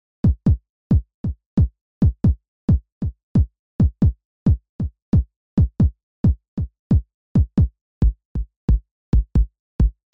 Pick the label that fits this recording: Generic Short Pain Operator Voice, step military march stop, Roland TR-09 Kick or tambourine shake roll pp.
Roland TR-09 Kick